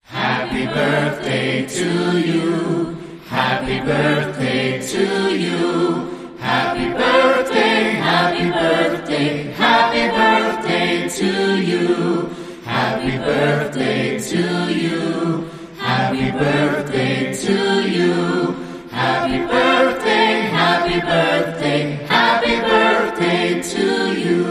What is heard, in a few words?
Acapella Happy Birthday